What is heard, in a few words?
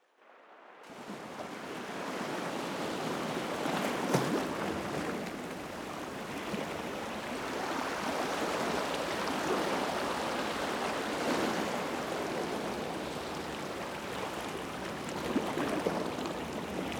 Ocean Beach